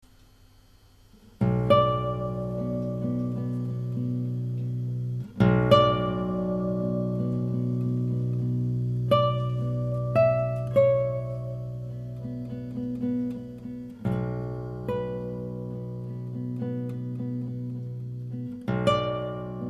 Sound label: Nuit, guitare folk